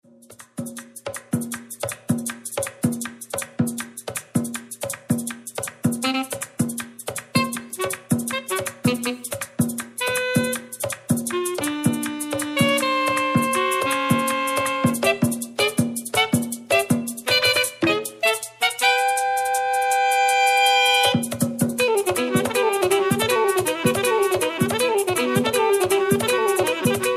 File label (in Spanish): merengue